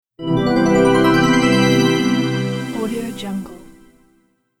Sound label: melodic sparkle logo